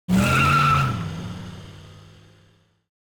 Car tire skid fast tire skid on asphalt with squeal